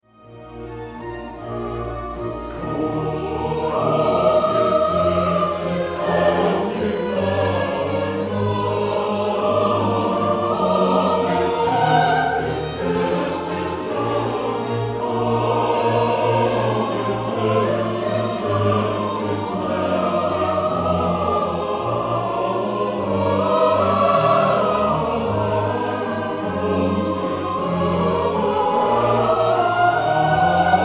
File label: chorus; matthauspassion